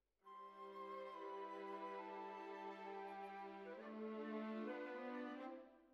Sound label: Violas Over